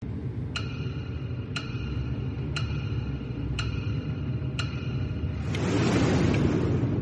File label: quick time event; before your eyes